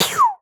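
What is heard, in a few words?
FX Gun, Beat Box; aawaz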